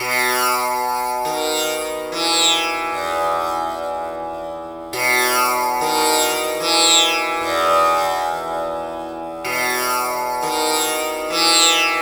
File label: TAMPURA; Spectrasonics; Heart of Asia